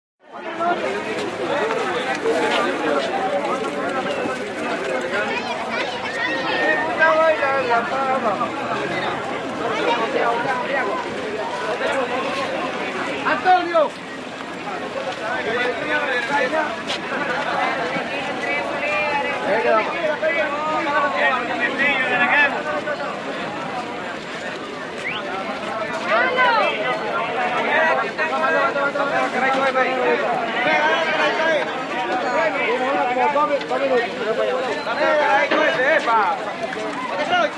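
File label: Open Market Spanish Walla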